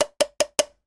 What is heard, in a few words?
food can soup empty spoon hit side remove remaining; zapsplat